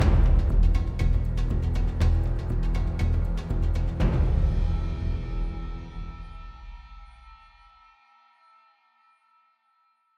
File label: Low Intensity Endtag